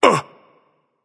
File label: nv surprised, vox; smite; voicepack; vulcan